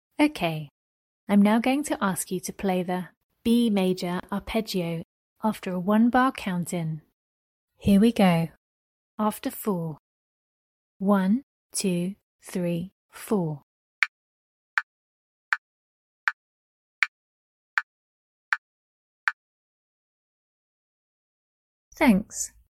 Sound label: b maj arp